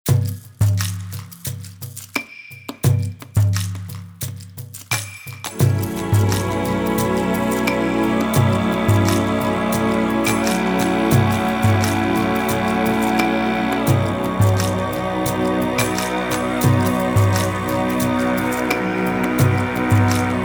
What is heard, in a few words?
Exotic Drama